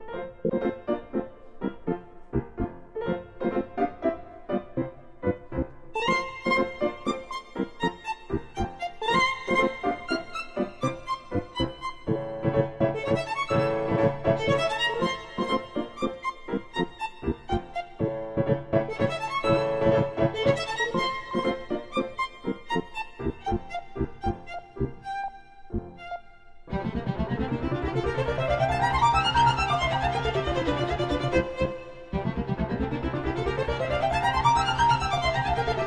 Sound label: Sync Sine Soni